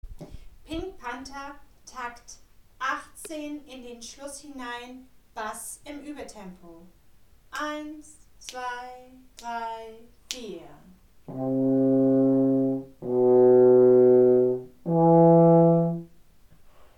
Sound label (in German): Theme Stimme 4, Posaunenchor; Takt 18 in den Schluss; Uebetempo